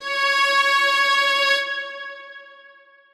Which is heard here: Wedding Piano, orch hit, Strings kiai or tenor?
Strings kiai